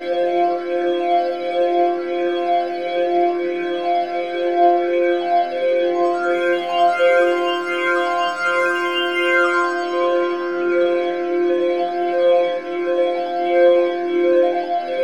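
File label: ambient drone; Zero G; Malice In Wonderland; AMBIENCES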